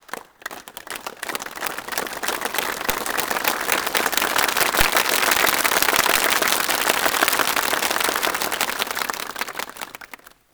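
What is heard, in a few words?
ZULU APPLAUSE; Spectrasonics; Heart of Africa 1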